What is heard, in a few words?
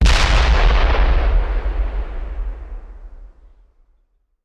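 Artillery Explosion; Sound Ideas; Guns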